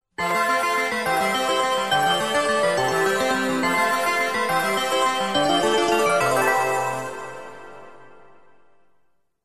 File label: game over, Chiptune; Gradius Collections (OST); Gradius 2 Space Odyssey